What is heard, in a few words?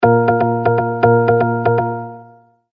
Multimedia Tone-Thinking/Processing